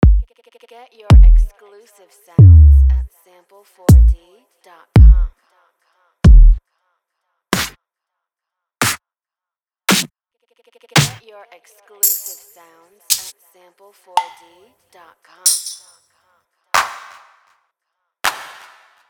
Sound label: Deep House drum music loops; sample4d